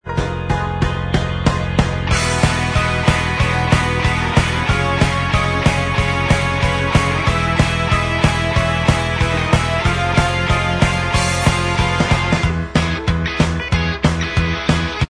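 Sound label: instrumental track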